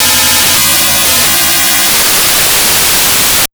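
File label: JUMP 130 NOISE, Big Beat Drumloops; USB Soundscan vol.25; AKAI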